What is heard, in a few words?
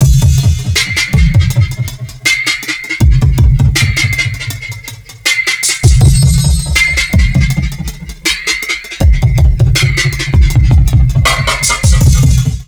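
neuro, drum loops crazy processed, 80 bpm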